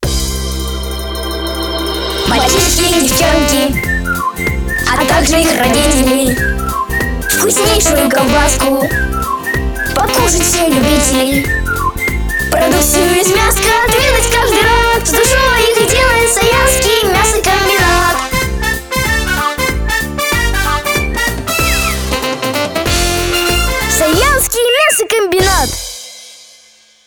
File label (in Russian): vocalnii